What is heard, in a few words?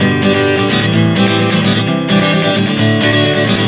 G-Am7-C-D 130 bpm, acoustic guitar chord progressions